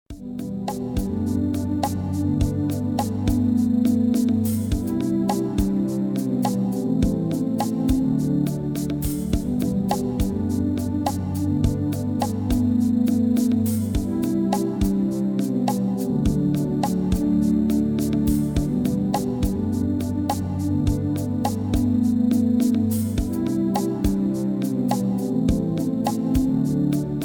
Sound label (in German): hopf musik